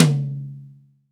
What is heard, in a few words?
TOM, DRY+AMB TOM